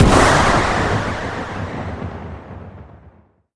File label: bomb; Aircraft-Battle